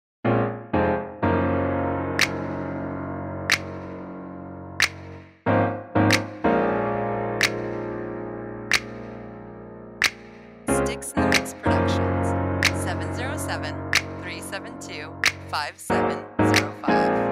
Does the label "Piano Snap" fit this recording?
Yes